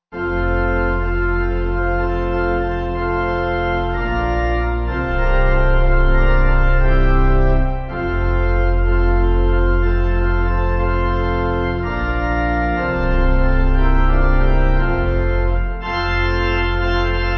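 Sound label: pipe organ hymn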